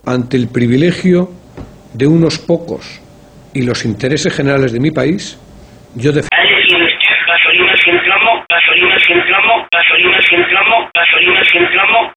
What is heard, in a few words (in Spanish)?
politono